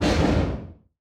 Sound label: distance swarm scroge missile fire, weapons; Stellaris